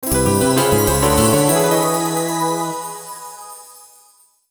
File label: Opening Chime; Netto de Tennis; Dreamcast; Capcom